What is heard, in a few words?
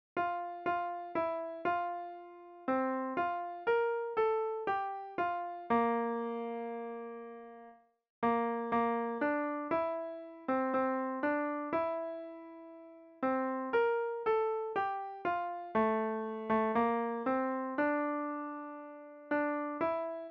hymn melody